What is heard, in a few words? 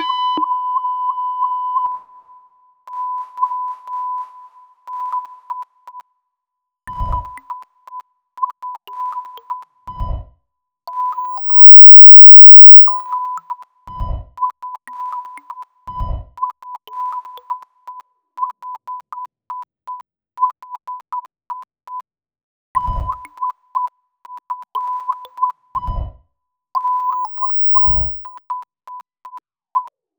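sine wave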